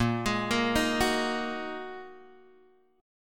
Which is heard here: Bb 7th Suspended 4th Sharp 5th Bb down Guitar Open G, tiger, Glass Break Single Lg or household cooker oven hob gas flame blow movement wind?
Bb 7th Suspended 4th Sharp 5th Bb down Guitar Open G